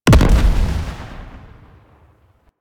Grenade Explosion C; Warframe; Corpus; Jackal Redux; Weapons

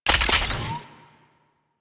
Attach; Archwing; Warframe; Rail Jack; Archwing Deploy

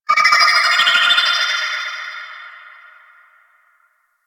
Mine Drone Underwater Vocal

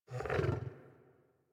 interact sleep sniff lay down, Kubrow; Enemies; Warframe; Tame

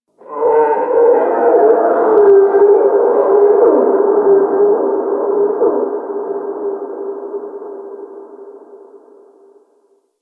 Ice Creature Distant Cry

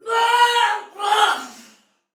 Death Soldier Female, Battle Barks; Dialog; Sinecure

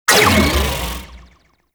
Cycron Mech, Corpus charge gun; Crp BE Cycron

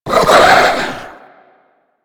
Head Shake; Warframe; Stalker

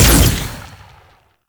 Fire Shot

